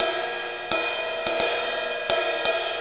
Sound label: Ride Cymbal Pattern